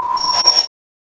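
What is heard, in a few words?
computer; quake2; mnhcoop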